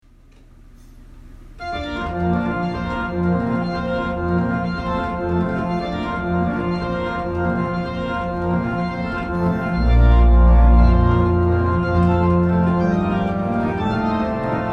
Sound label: Postlude Composition; Palm Sunday; Snowbeck